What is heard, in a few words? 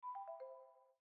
alert